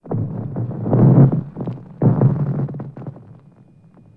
Cracking; ImpGal2; colony

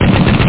mchgun; Doom

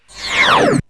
WIPE

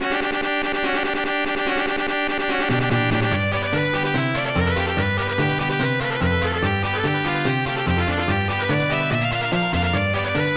fiddle; FF7DEMO

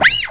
fx fret; cubic

